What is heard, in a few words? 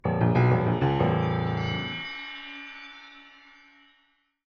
Jingle Treasure Room Discover; The Binding of Isaac Rebirth Afterbirth